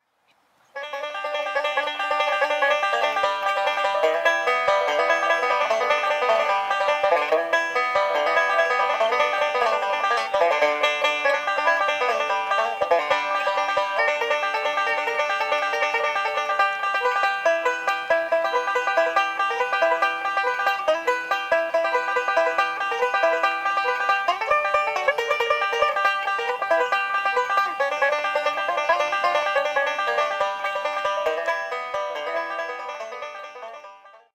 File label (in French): banjo; pincees